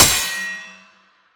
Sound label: parry; limbus